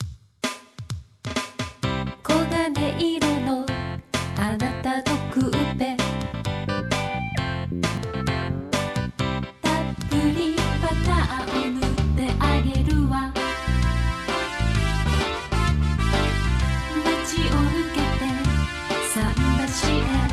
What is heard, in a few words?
Highway Jazz; Bonus - Dribble's Highway Jazz